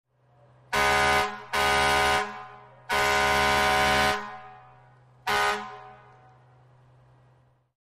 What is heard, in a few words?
Firetruck Horn Blast, american